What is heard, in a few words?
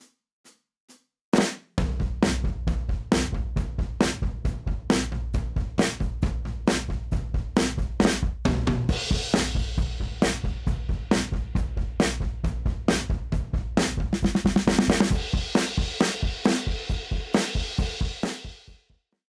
drums 1970s; vu loaded